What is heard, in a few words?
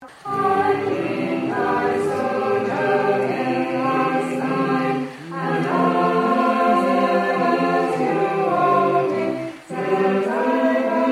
alto, singers